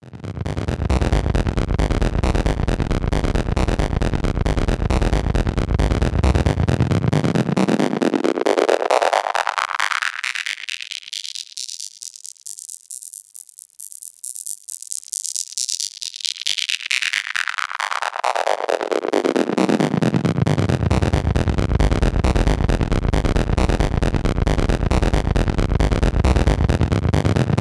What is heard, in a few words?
Musical bed distorted rhythmic bass phaser; BLASTWAVEFX